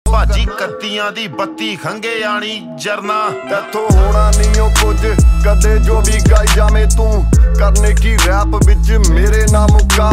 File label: Jatt Punjabi Ringtone